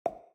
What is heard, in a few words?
combo